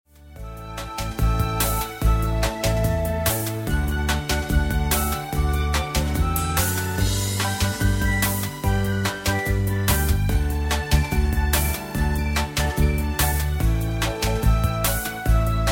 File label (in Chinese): Karaoke, 正向心理兒歌